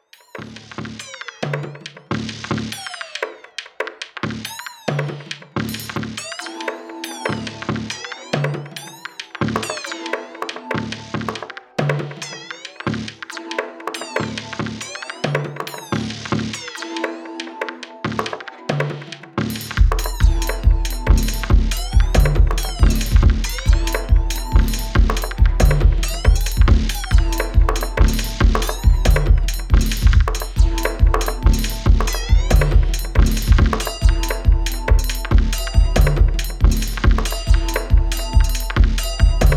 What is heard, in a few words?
trumpet